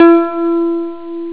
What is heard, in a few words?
Harp; ST-XX Amiga Soundtracker Sample Packs